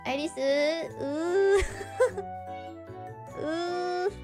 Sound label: uuuuuu; noises